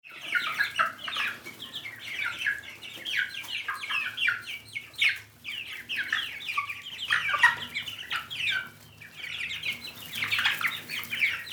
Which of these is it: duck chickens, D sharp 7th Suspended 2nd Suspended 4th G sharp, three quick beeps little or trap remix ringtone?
duck chickens